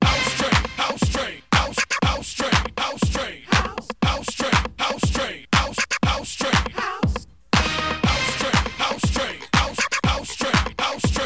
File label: House, London Mix